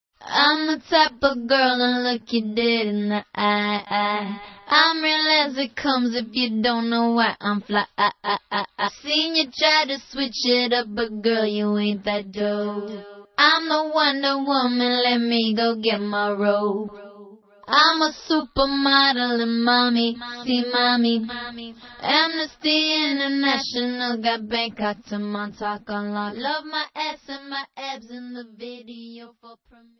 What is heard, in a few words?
acapella